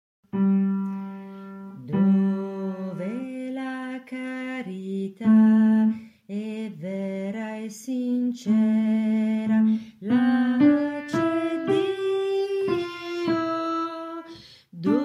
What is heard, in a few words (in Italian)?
Dove la Carit CONTRALTI; ARCIDIOCESI DI SANT’ANGELO DEI LOMBARDI-CONZA-NUSCO-BISACCIA